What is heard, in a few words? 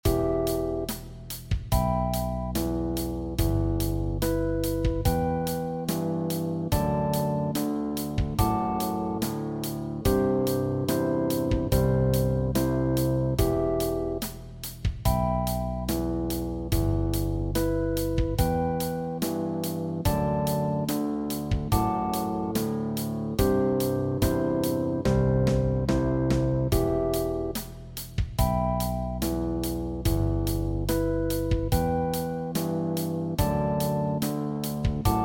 Folk No Guitar; Tryout track; 2027 MMEA conference All-State Collective Ensemble audition